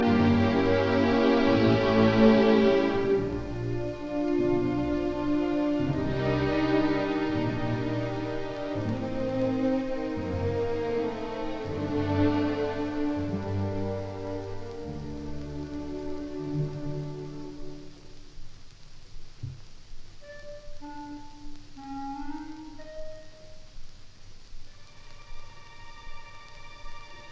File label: Symphony in B flat major Finale Adagio Allegro moderato; No 5; WAB 105